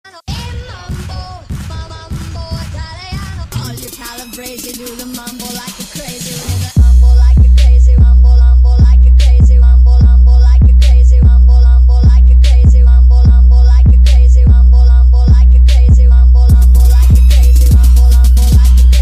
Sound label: Mega Bass